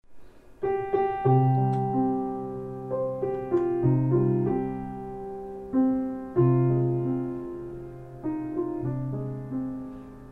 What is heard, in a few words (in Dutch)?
halve maan piano